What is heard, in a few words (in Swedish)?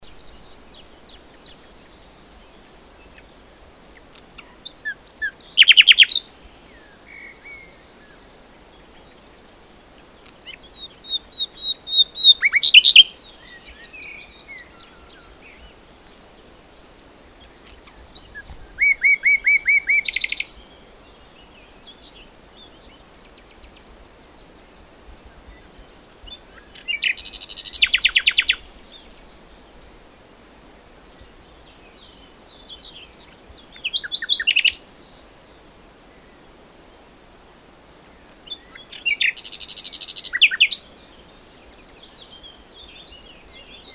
Sydnaktergal